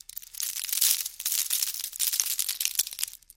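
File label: wafer sounds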